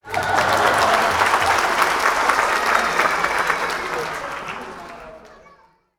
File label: laughing audience clapping